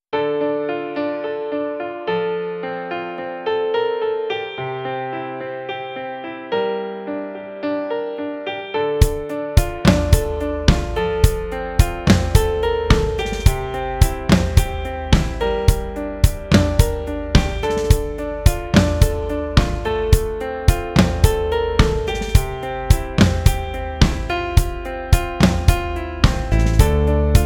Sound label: Karaoke; Vokalausbildung